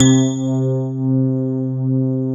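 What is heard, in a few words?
grand piano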